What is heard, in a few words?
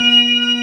B3 C 3, ORGAN; VOCAL ORGAN; ORGAN #1